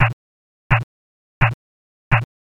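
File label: footsteps march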